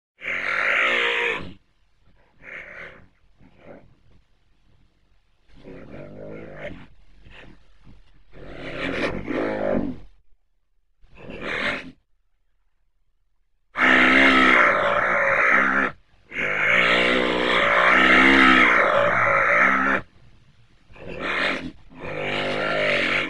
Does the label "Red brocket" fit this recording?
Yes